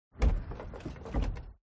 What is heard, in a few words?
CONSTRUCTION GARAGE Car Lift Down Shaky; smartsound